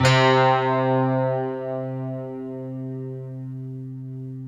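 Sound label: slow strings guitar; Optical Media International - Sonic Images Library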